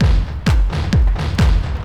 130 tribal, LOOP PERC TRIBAL; Techno Trance; DRUMLOOPS; Ueberschall - Techno Trance Essentials

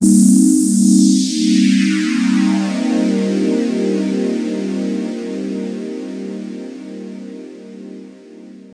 Sherbet Dib Dab Eb, Pads; Zero-G; Total Drum Bass

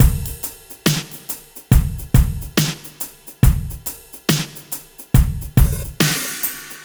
SloMo Beats, Loops 140 BPM

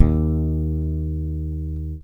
DRY BASS, Electric Basses; PlatinumPhattCD2; E-MU Producer Series Vol. 8 – Platinum Phatt

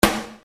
Snare Dr

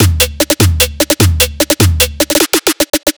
150BPM Drum Fill; Antidote Advent; Infected Track Drums Ableton Project

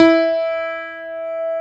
CP-80, Yamaha CP-80; Greytsounds VOL-1

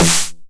909 SD Tape Sat

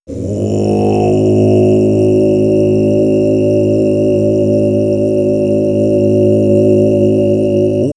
Monks, vox

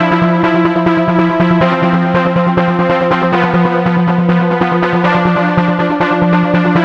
NRG Pad

